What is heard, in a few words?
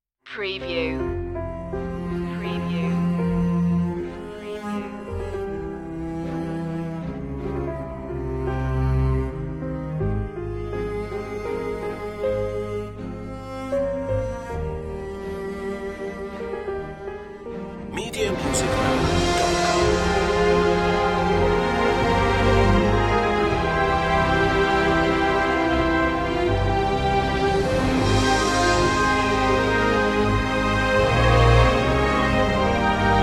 music box